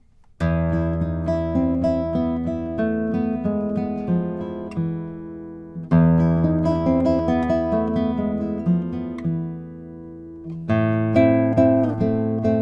lowden s32jazz alpine spruce top

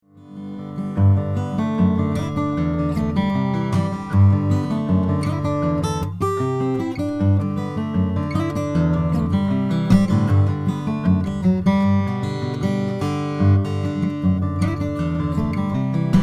goodall rcj aaa indian rosewood

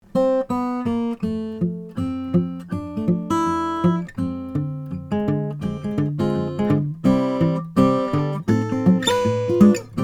l 00 gibson; kim walker; 1998; 1930s; sunburst

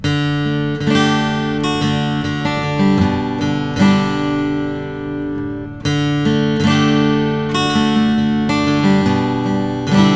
2006 bourgeois vintage jom adironda nat; natural

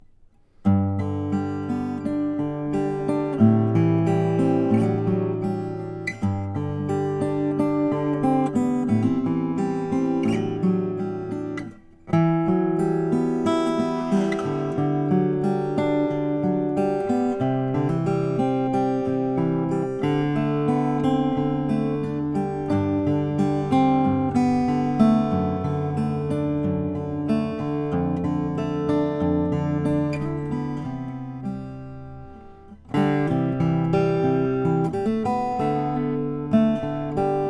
sheeran s04 figured walnut

